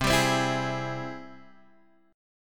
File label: Ab Augmented Major 7th C, guitar